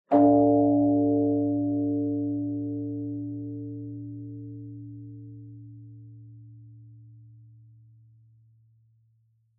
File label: Bonsho Low